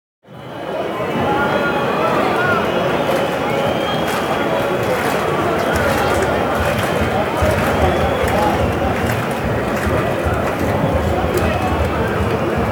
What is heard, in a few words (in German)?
Stadion Atmosphaere